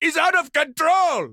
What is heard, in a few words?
town human far; Blind Drive